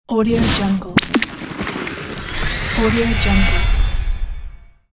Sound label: spell